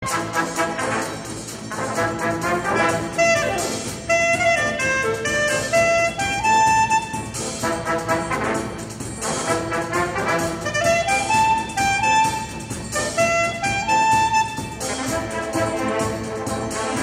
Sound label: instrumental